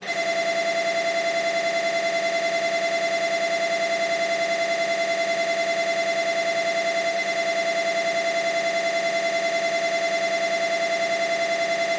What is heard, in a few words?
siemens 3t magnetom prisma fit whole body human scanner mri ep2d